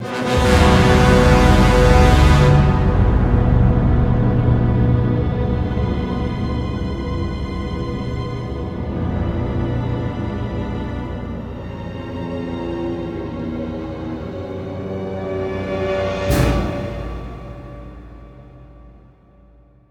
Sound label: Music Game Over; Pilot Down Behind Enemy Lines